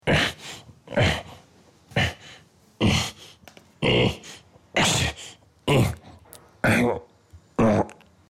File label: bash